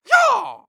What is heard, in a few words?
Human Karate Yell "Hey-Yah" Fast